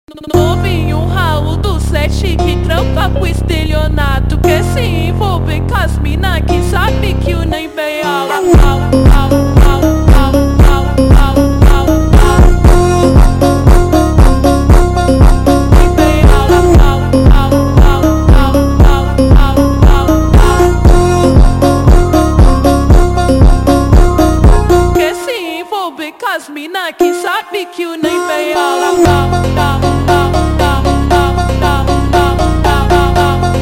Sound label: Mambo Slowed